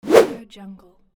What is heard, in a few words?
sword swish